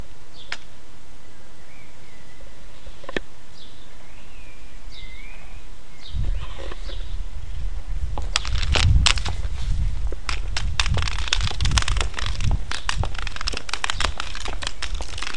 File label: walk to the bus stop with lots of beautiful birdsong recorded in stereo; on tuesday